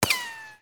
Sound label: bullet ricochet